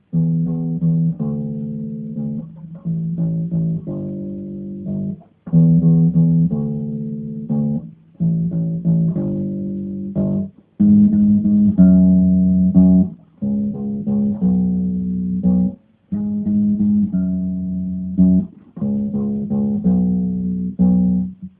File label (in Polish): Refren